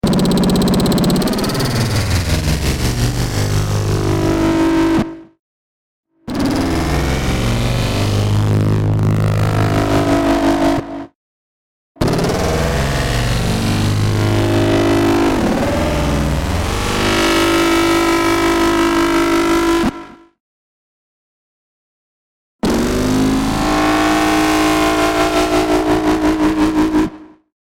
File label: Distorted and scary Industrial drilling; Distortion; Sound Design; Hell's Drill